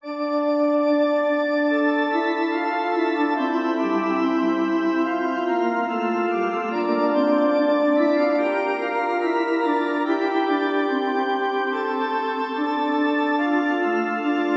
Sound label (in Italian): Esercizi di contrappunto a due voci; Funftel Gattung; Ronge pagina 60